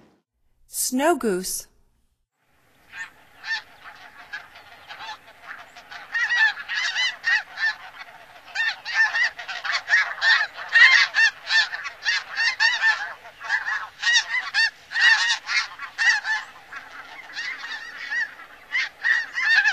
Snow Goose; North America; Stokes

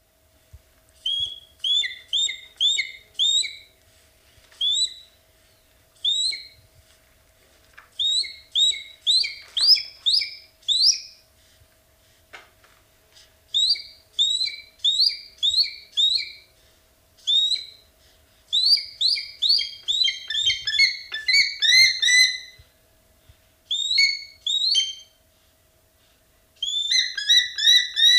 Spizastur melanoleucus, calls; S. America; Eastern Lowlands

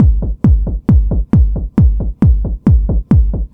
Drums Techno

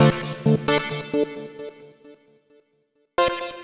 Lead Synth

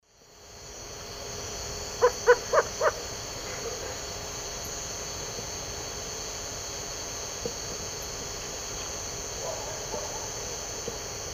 Megascops choliba, call or subsong; S. America; Eastern Lowlands

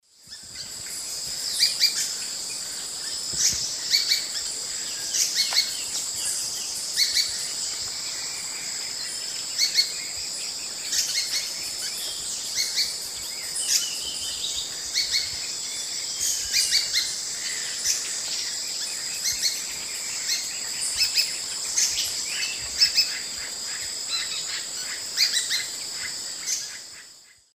Platyrinchus saturatus, calls; S. America; Eastern Lowlands

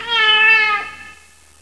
CAT

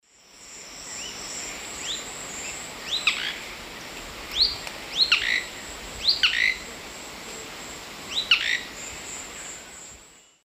Lepidothrix coronata, song; S. America; Eastern Lowlands

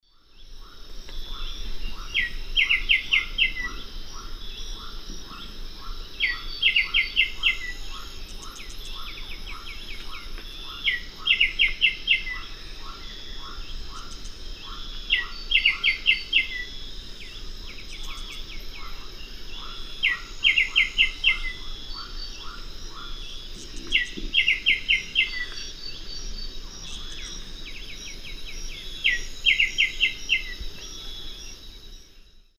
Pachyramphus polychopterus, song; S. America; Eastern Lowlands

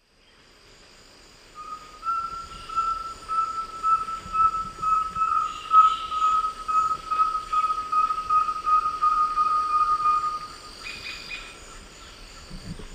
Liosceles thoracicus, songs and calls 2 birds; S. America; Eastern Lowlands

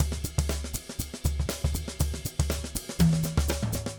straight busy; odd loops

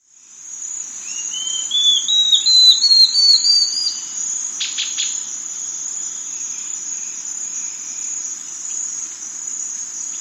Speckled Antshrike, S. America

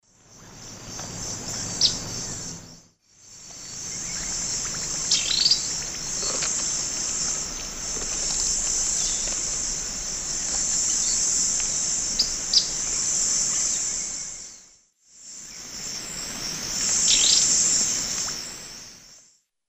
Serpophaga hypoleuca, calls; S. America; Eastern Lowlands

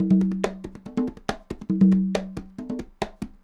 congas 140 bpm, latin; Sound Effect Library

